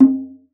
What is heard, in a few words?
Conga, Session Instruments